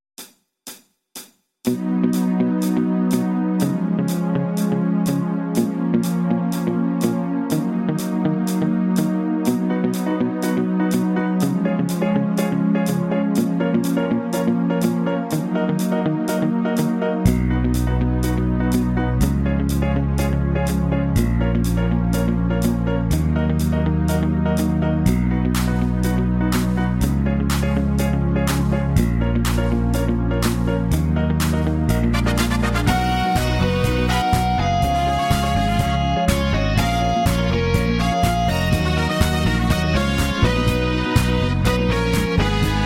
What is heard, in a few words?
80s Disco Instrumental